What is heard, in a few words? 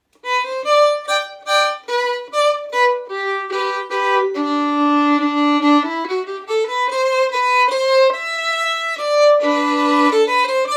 Slow; Mr Fishers